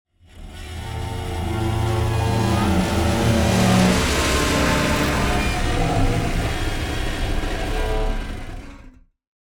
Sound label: Strings and wires dissonant bow stroke